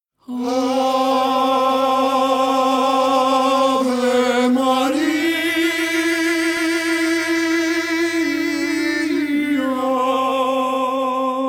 Sing Ave Maria; Three ccMixter Tenors

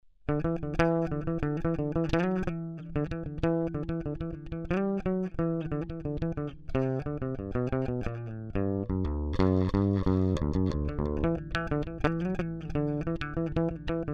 Bass Phrases

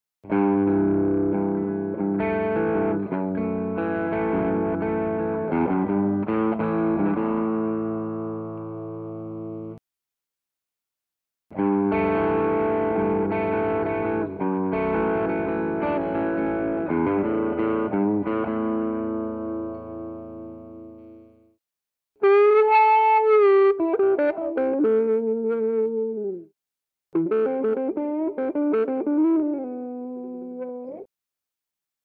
Eye Of the Owl Guitars

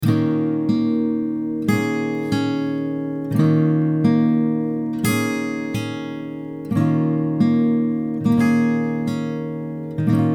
D m143 GTR sample

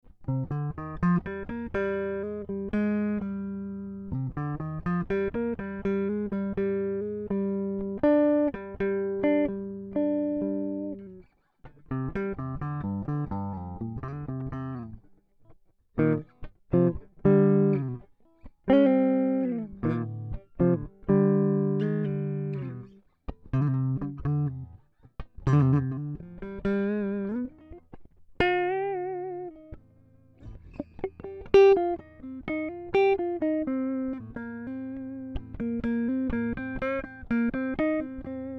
Cm124 Funky Groove

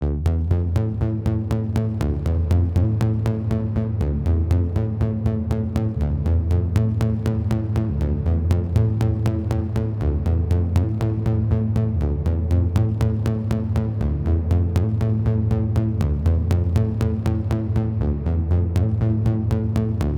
120 Riffer Bass

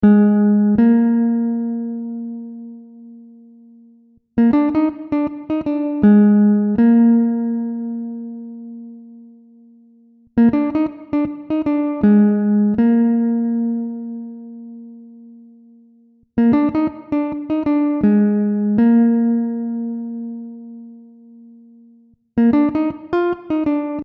instrumental

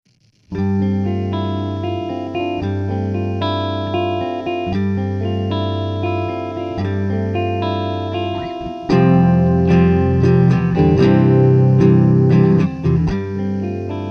Snow riffs

